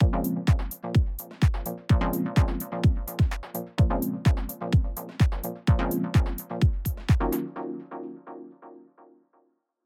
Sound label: house chords mix